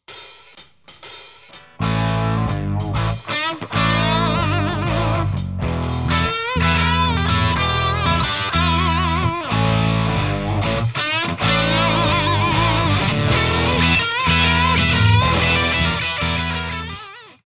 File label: Gibson Crunch; Heritage